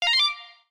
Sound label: button